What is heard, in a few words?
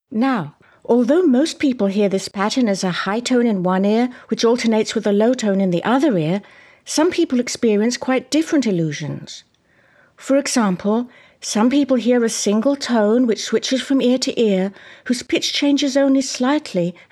Octave Illusion; Deutsch; auditory demonstrations